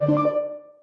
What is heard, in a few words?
warning; freecell solitaire